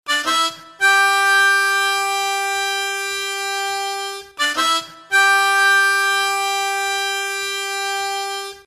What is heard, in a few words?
Tongue, harmonica